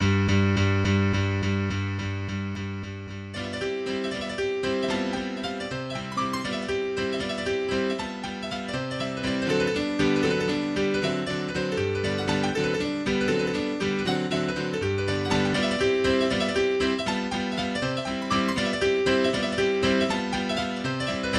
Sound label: Mazurka C Major Vivo